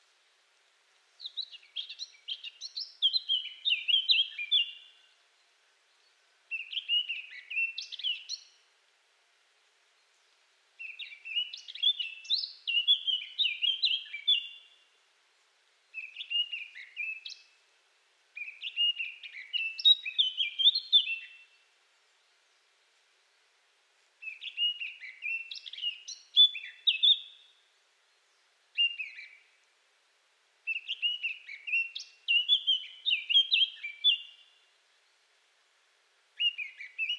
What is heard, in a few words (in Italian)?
Capinera Sylvia atricapilla